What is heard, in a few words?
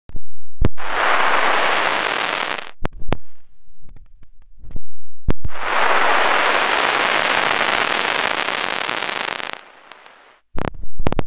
Radio Noise; SFX